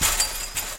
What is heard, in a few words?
fence; lmctf